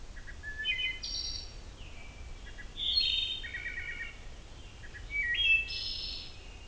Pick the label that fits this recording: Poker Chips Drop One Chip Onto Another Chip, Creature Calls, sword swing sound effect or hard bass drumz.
Creature Calls